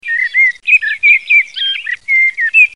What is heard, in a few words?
Common Blackbird